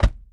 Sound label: idle chestbeat; smite; raijin